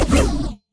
swing; smite; poseidon

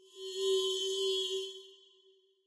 angelic victory; Smite; Thanatos

